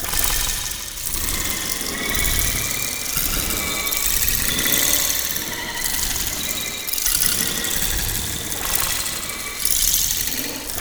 Movement Loop; Warframe; Sentient; Adis

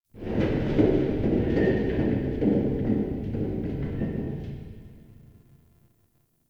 Cave Mults Metal Tension; Ambience; Warframe; Stalker

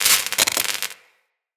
UI Crafting Spark

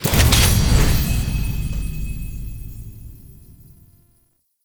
Weapon Select Confirm, UI; Warframe; Awakening Remaster

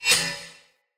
Curved Sword Whoosh Accent; Weapons; Player; Swords; Sinecure

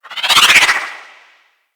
Arch Wing Dodge Roll Right; Vehicles; Movement

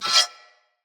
Melee Impact Metal

